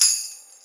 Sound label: tamborine; foxdot default